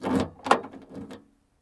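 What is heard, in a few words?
opening of a breaking old metal door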